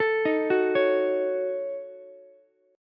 Eb Minor 7th Flat 5th, down, Ukulele; arpeggiated; chord; JGuitar

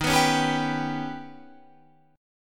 D sharp Augmented 7th D sharp

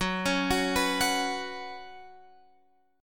Gb Suspended 4th Gb, down, Guitar, Open F; Gbsus4 arpeggiated

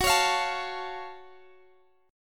F sharp Minor Major 7th F sharp; strummed; x,x,16,18,18,17

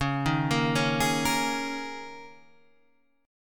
D sharp 7th Suspended 4th

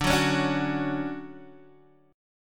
D Major 7th, D, down, Guitar DADGAD

